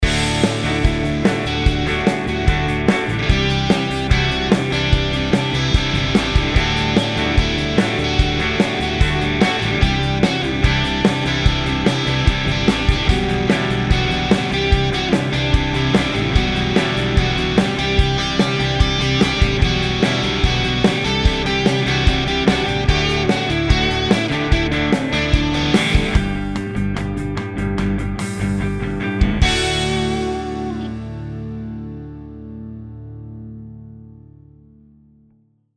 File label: Yamaha Snare